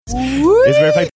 wheeeeeee

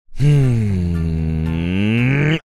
hmmmmm

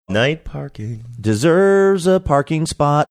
singing; night parking deserves parking spot

